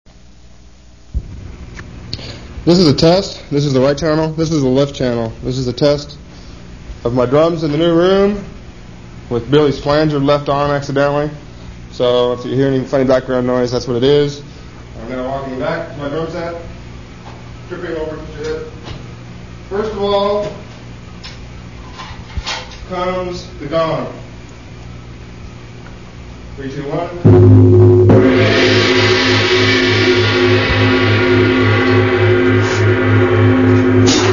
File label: drums sound check